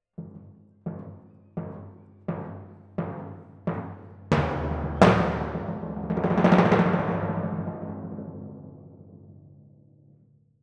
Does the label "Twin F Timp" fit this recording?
Yes